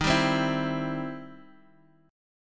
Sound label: E Minor Major 7th Sharp 5th Eb